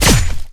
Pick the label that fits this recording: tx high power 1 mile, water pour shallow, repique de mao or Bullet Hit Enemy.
Bullet Hit Enemy